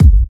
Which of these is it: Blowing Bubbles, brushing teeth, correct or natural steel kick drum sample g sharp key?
natural steel kick drum sample g sharp key